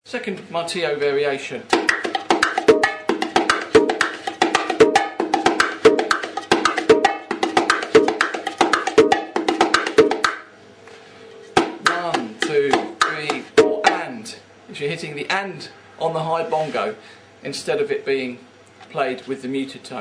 BASIC MARTILLO, bongo

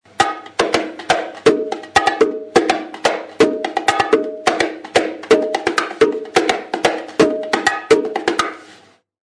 SLAP, bongo

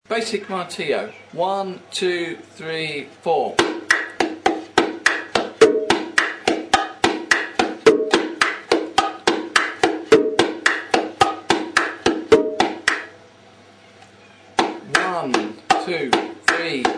BASIC MARTILLO, bongo

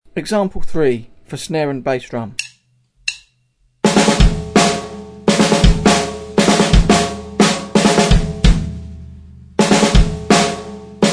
snare, drum set

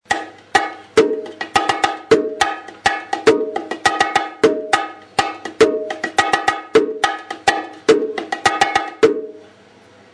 SIMPLE MARTILLO, bongo

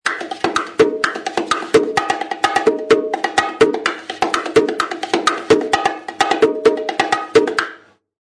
MARTILLO STYLE, bongo

